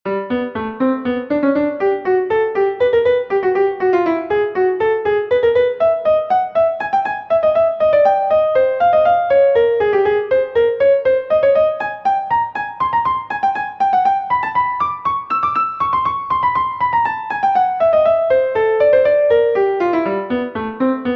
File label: Piano Bach inspired